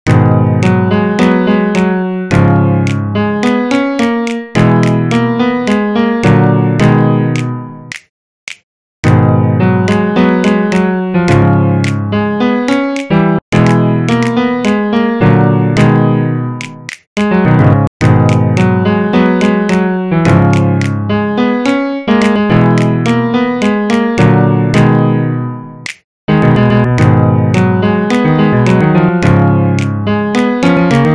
piano

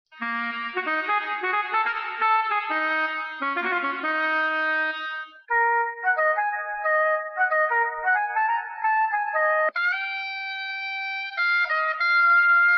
Sax Phrase

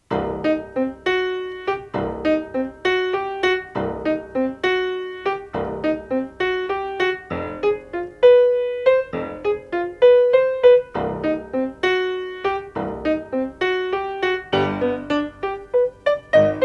Piano

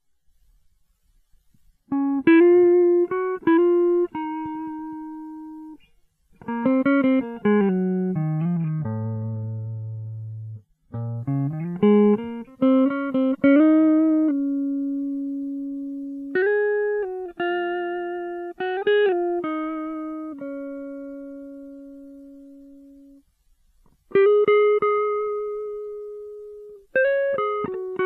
spaceship

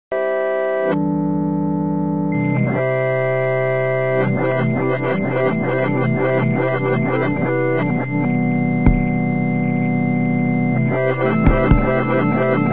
Instrumental

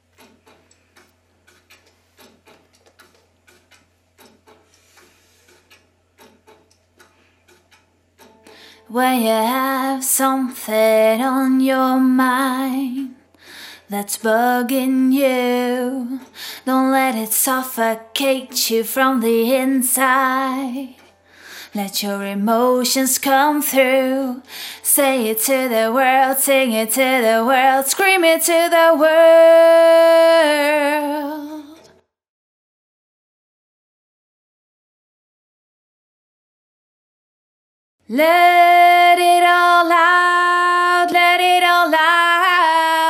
Acapella

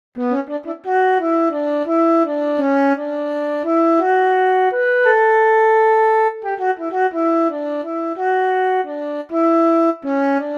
Flute

